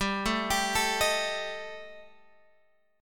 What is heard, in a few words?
G Suspended 2nd Sharp 5th, Guitar Open G